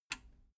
metal button radio release power off

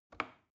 plastic button small push regular

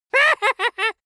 dwarf laugh

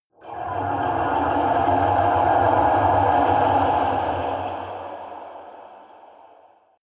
underwater rumble

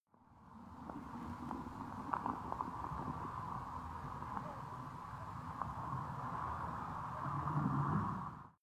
abstract beach

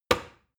plastic button start push heavy

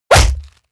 stylized fist punch

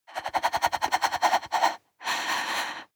breath female stutter

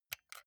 console controller xbox rb press soft

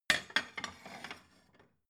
plate shelve slide soft

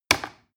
plastic button little click heavy fast

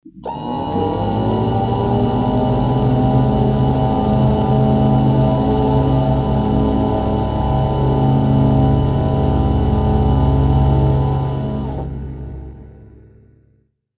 tonal event

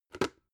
plastic bowl grab

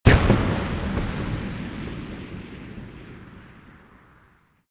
explosion

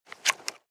nylon leather clip scrape

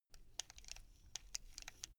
buckle rattle short slow

